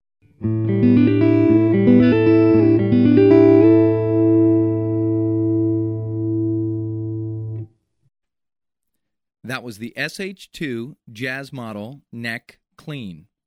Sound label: Seymour Duncan Jazz, pickup samples